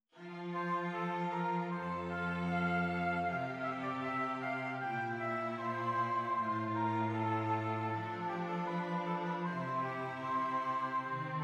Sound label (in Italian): esercizi di contrappunto semplice A tre voci